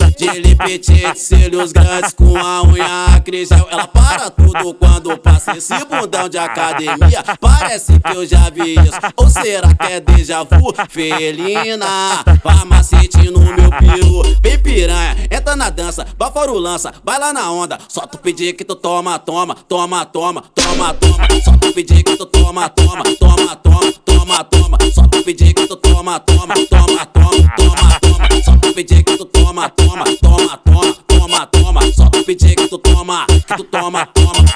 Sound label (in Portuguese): funk sertanejo